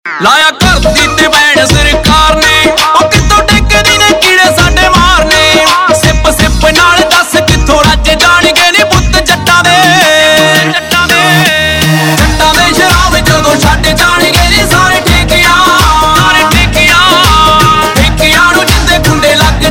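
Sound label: Ringtone, Punjabi Pop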